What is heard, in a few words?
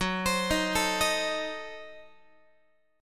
F sharp Diminished 7th F sharp Guitar; F#dim7 arpeggiated; x,14,15,13,13,14